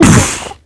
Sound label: fall, cyborg